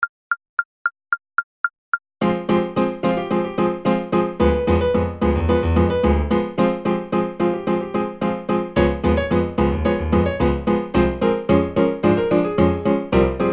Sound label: Charleston 110 Secondo Primo; Seiber